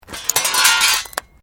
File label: Metal Light Movement Minor; Materials